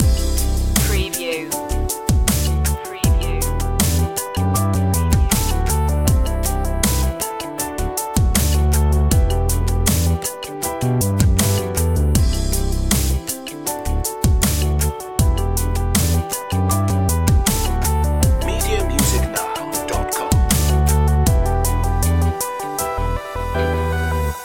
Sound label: progressive dubstep